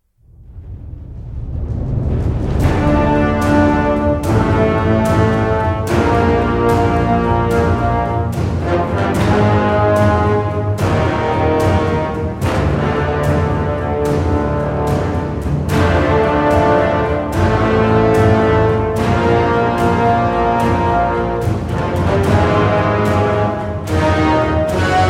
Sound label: arathi combat dark